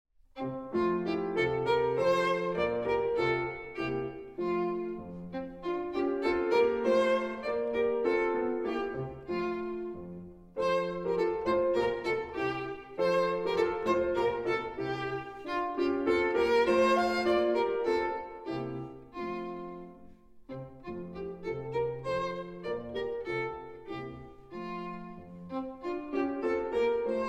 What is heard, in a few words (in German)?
Instrumental; Ein Maennlein steht im Walde